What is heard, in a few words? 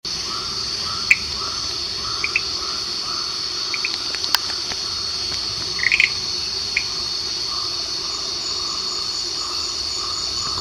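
kurixalus chaseni, Frilled Tree Frog call; Johor, Peninsular Malaysia, 23 Dec 2016, 2000 hr; Rhacophoridae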